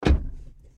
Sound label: car door